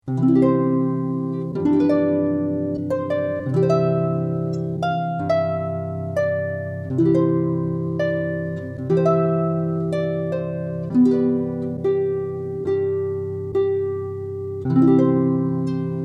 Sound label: Harp; Trumpet Voluntary